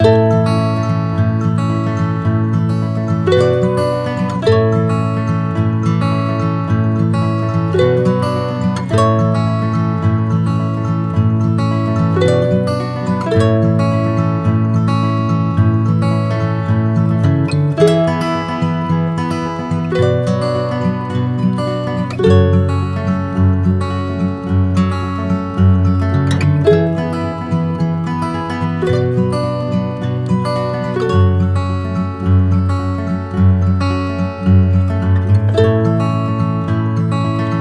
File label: acoustic loop